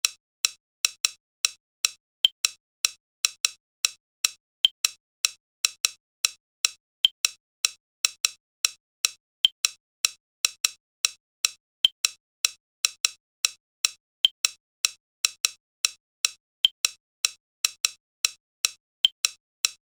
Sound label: Tick Phase